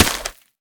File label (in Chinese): 沾泥的红树根破坏声